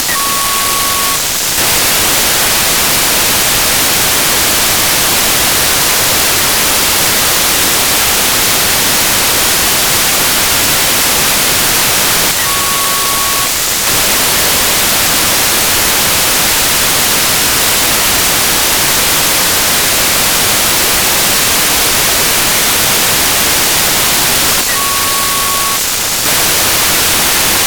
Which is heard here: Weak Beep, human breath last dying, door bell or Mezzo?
Weak Beep